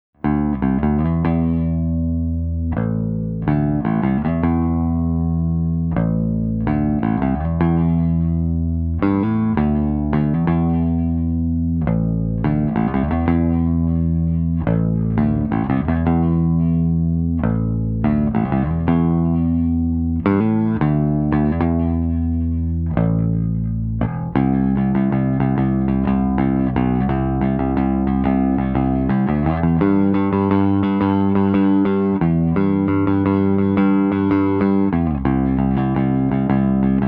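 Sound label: DI Line Bass, mono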